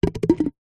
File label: sport cone plastic set down drop concrete ground; zapsplat